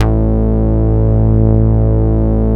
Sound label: MOOG A2MF; 90S MOOG